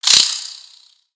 Spider Attack Ranged; creatures mechanical; Lego

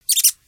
Pet Rabbit Speak

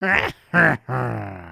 Walk, creatures organic

